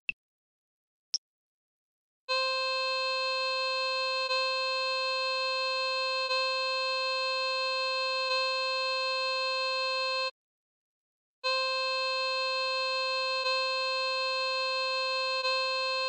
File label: c harmonica